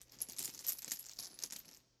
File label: tripalium chain; ambience room torture